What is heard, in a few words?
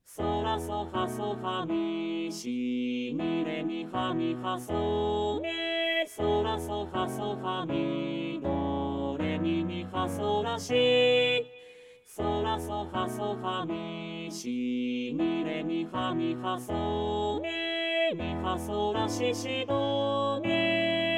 Triplets